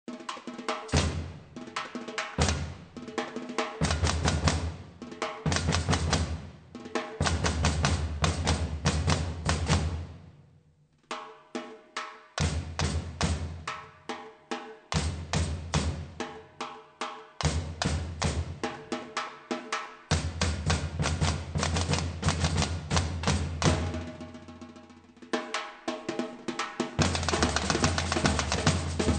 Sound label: Samba; Salgueirico